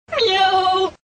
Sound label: meow; gir; children